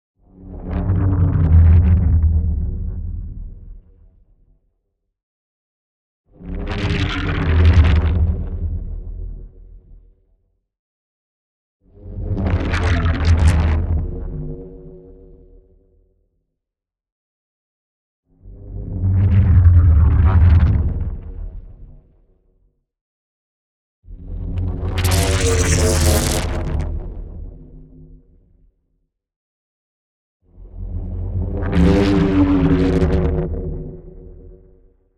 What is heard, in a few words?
NEON WHOOSH LARGE SLOW Beam Sabre Movement Growls; boom library